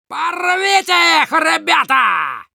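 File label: battle cry, Russian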